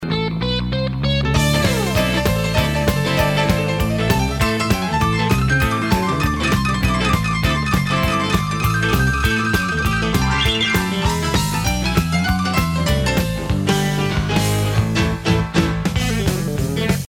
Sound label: Piano Solo